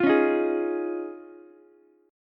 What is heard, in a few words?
D sharp 7th Suspended 2nd Suspended 4th, Ukulele; D#7sus2sus4 strummed; JGuitar